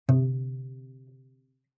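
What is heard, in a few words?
Cello pizz fortissimo sul C C2; MIS Pitches - 2014; Strings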